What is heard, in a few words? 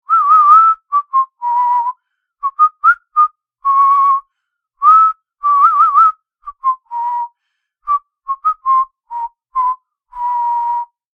Human whistling a song whistle song